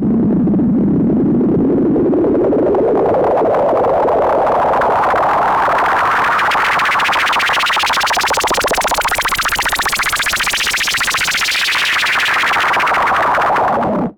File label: WIND RATTLE, SYNTH FX; AMG - Vince Clarke Lucky Bastard